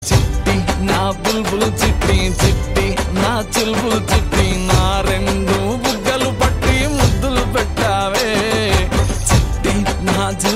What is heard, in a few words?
BGM; Jathi Ratnalu